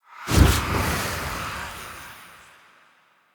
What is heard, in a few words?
Hallow Spirit Energy Cast; Halloween